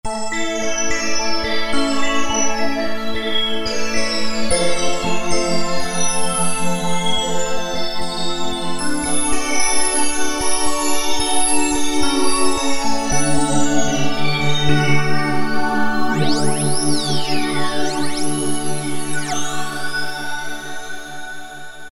Pad, Alesis Micron; Factory Programs; Kirumamoru

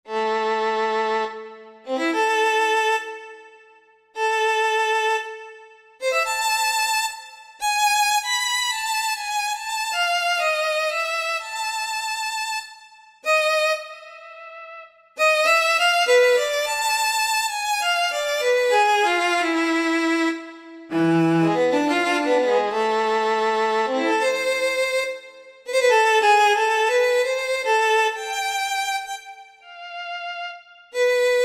Solo String, Korg TR-Rack

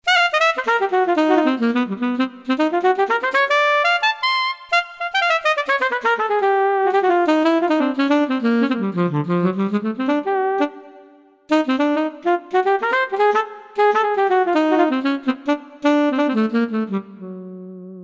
Alto Sax, Korg Wavestation A-D

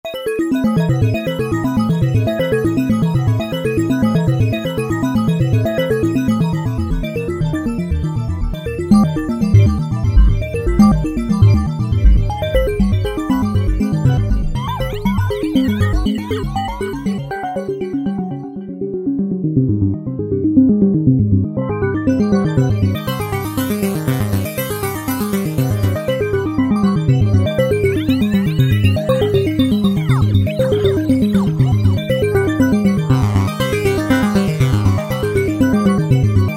Sine Bell, Roland V-Synth; Factory Patches

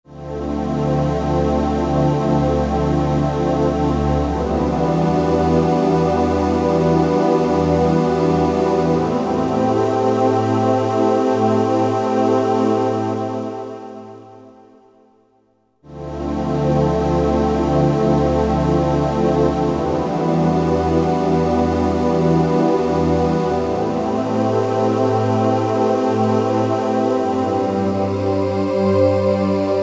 Roland V-Synth Vocal Pad; Factory Patches

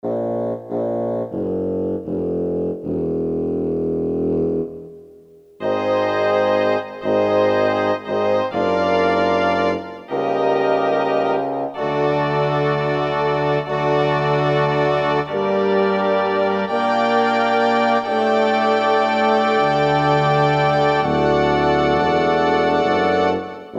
Woodwind, Korg DSS-1; Woodwind & Strng

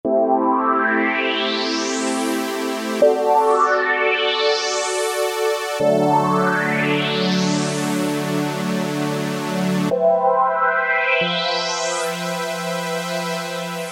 Pad Sweep, Alesis Micron; Factory Programs; AstrologySweep

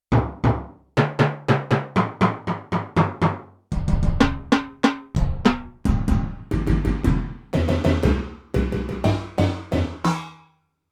Gate, Timpani & Gong, Yamaha TX16W

